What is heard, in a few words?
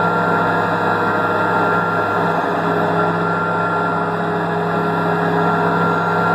turbine rpm mid, tanks; War Thunder